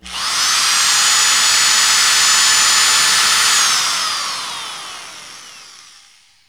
DRILL

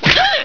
WHIP

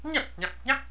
YUK YUK

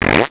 SQUISH

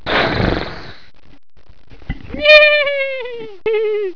HORSE

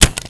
punch

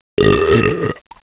BELCH

